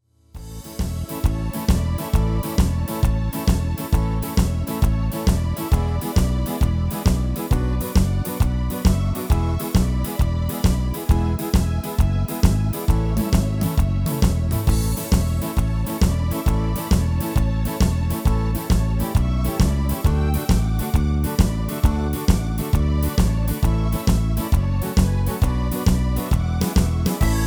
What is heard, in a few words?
MIDI